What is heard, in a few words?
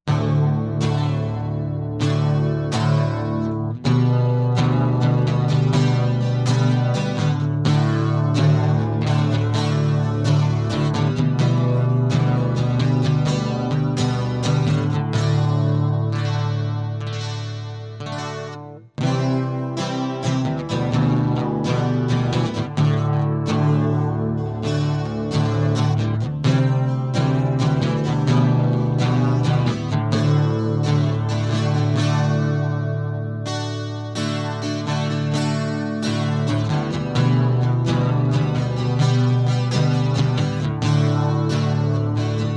acoustic